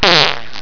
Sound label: fart; Higher pitched cheek fart sound.